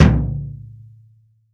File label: BD, REAL DRUMS, JUNGLE KIT